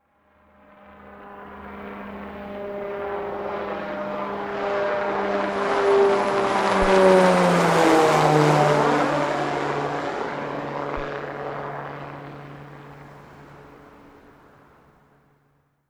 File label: Helicopter Fly By; podcasting